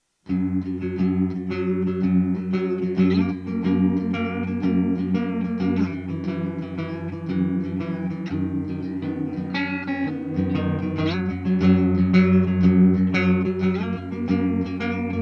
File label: guitar wet